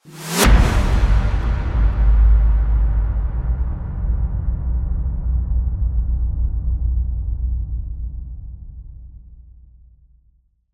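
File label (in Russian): ужас провал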